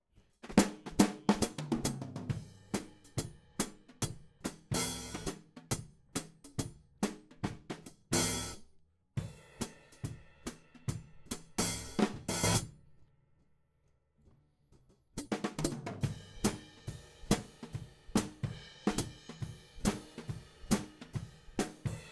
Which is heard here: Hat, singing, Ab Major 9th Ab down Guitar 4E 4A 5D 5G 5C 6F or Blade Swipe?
Hat